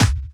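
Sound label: snare drum bass drum, Dance Mega Drums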